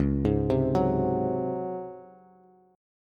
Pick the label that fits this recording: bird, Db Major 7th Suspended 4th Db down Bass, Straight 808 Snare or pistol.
Db Major 7th Suspended 4th Db down Bass